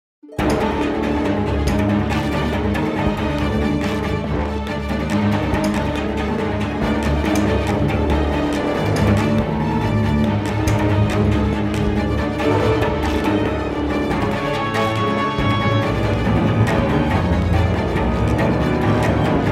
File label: Loop Fight; Game of Thrones: A Telltale Games Series